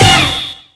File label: alarm